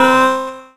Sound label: Synths Root C